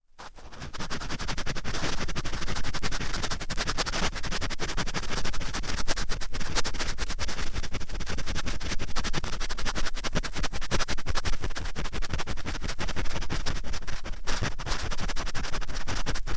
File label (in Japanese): 高速綿棒耳かき 両耳同時